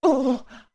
Ledge Fall; Zora Link; Link falling off a ledge

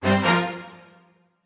Battle Strike

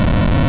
Rumble; Oracle

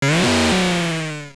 Boss Scream, Dungeon bosses screaming; LOZFDS

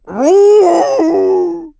Thrown

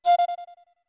Notes Flute F short; OOT

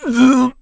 Sumo Escaped; Sumo match: Bo's grasp escaped by Link

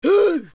Cursed Spider Attack; zelda; OOT

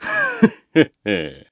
Heheheh; Groose: "Heheheh!"; Skyward Sword

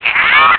Spin Attack; Link's spin-attack shouts

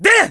Jump Strike

